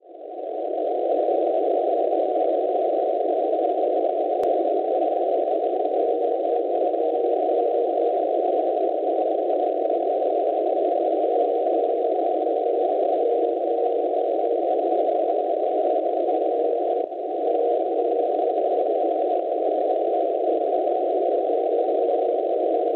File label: NDB Nimes 354 kHz; NG; Francia; Schwarzsee